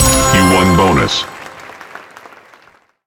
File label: bonus, roulette; wbg games